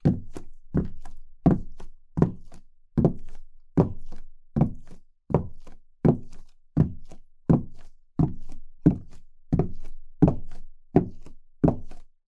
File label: Footsteps Female - Wedges Wood Slow